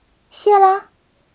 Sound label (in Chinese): 被治疗; BattleCry; 薇薇安